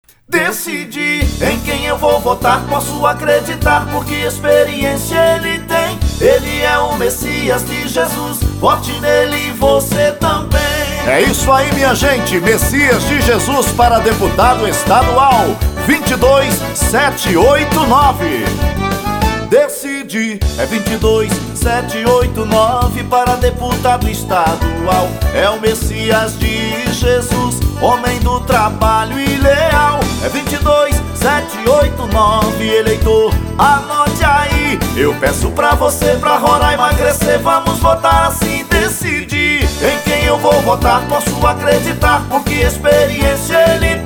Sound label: jingle; mecias de jesus